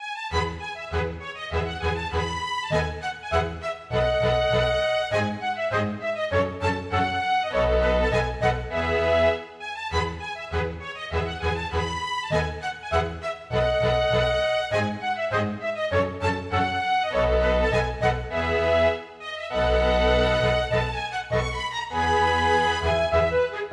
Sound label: Gallopade; Ursin; storgårdsmusikk